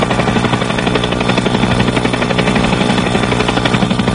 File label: Rotor